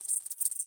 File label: Env Void Grasshopper